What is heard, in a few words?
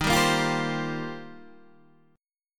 Eb 6th Add 9th, Guitar, Half Step Down